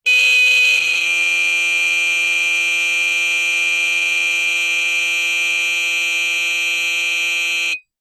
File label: motorcycle horn honk